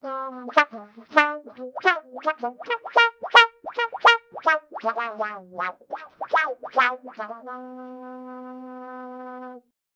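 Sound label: cartoon style trumpet voice, wah wah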